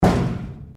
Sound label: Heater Vent Hit Lower Part